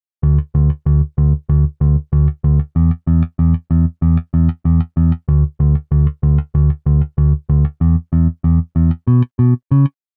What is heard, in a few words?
Bass B